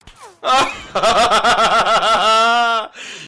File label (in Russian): smekh; karitarshow